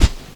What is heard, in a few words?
dry sand packed; creatures